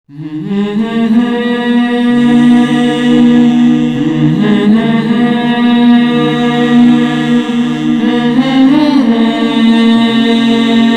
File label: vocal